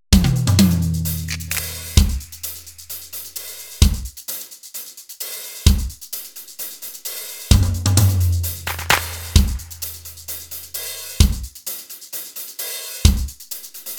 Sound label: Drum circle in the forest